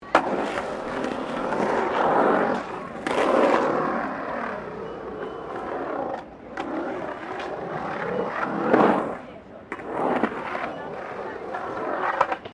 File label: Skateboard Skate Bowl Round Bowl; Skateboard sounds; Sports; Skateboarding